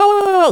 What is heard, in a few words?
pain moderate; VIVAE